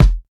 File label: fat kick f key; kick drum samples